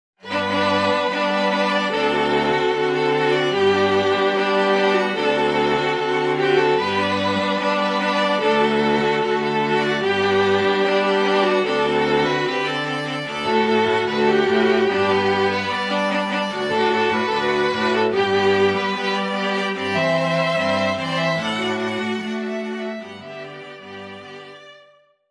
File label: STRING QUINTET, INDIAN MUSIC